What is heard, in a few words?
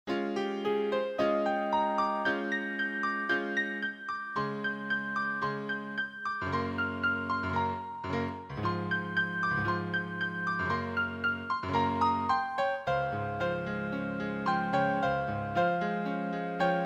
improvisation droplets